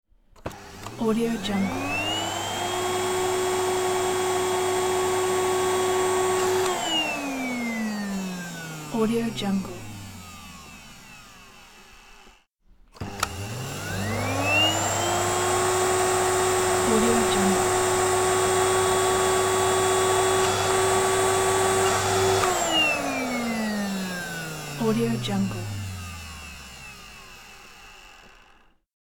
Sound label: vacuum cleaner; dragospitaru